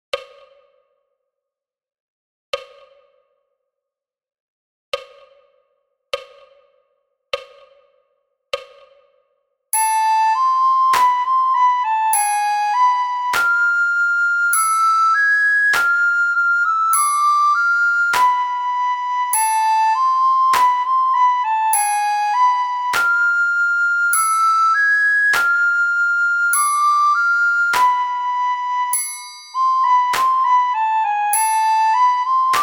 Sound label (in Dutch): sopraan; Flierefluiter 6; Zelda